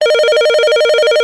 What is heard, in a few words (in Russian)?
telefon